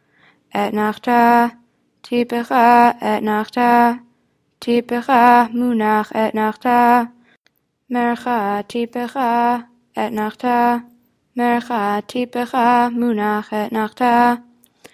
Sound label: polish lithuanian torah אתנחתא